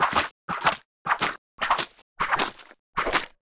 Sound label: Wand 1H